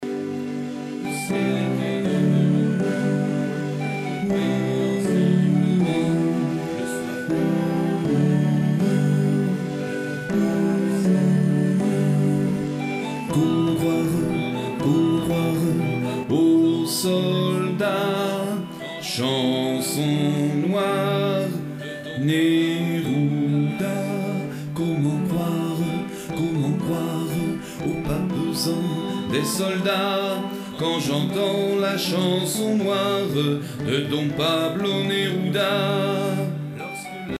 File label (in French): BASSES; Pablo Neruda